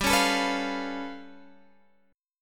G 9th Flat 5th, Guitar, Drop D; down

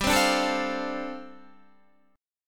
G 9th Suspended 4th, Guitar; Listen to G9sus4 strummed; G9sus4 Chord

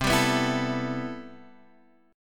C Major 13th, Guitar Half Step Down, chord

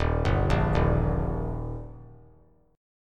Eb Major, down; arpeggiated; JGuitar

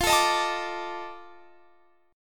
F 13th, Guitar Standard; F13 strummed

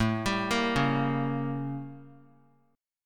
A 7th Flat 9th; A7b9 arpeggiated; JGuitar

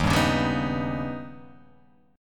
Eb Augmented 7th Eb down; Eb+7 strummed; JGuitar

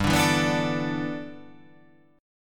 G 6th Add 9th

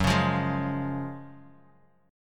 Gb Major 7th Suspended 2nd

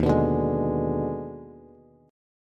Eb Major 7th Suspended 4th Sharp 5th Eb, Bass; strummed; 11,14,12,13; EbM7sus4#5

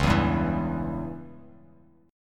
C sharp Diminished C sharp, Bass Standard; C#dim strummed; JGuitar

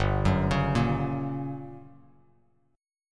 G Minor Major 7th Flat 5th G, Bass Standard; arpeggiated; 3,9,11,3

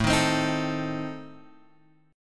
A Diminished A down Guitar Open G

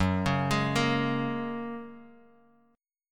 Gb Major Add 9th Gb, Guitar Drop D; chordshape; down

